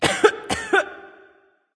clearthroat; erlangshen

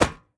mvt grab; smite; gilgamesh

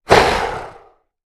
hiss; Smite; Sobek

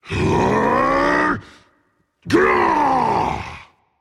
battlecry rage; chaac

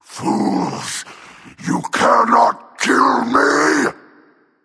death; cerberus

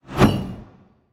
sickle swing; smite; osiris

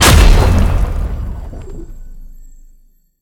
impact; Smite; god Lancelot

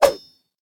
knife; Smite; Vulcan

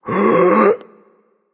nv breath, vox; smite; voicepack; vulcan

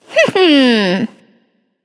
hehheh; smite; voicepack; scylla

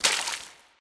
defeat splash; smite; khepri

